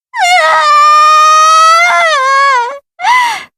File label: cry